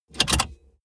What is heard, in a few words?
car bmw e46 interior safety belt clasp inset hard